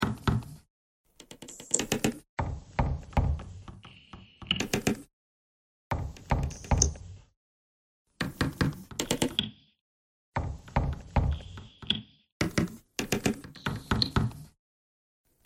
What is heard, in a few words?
bagpipe thread noise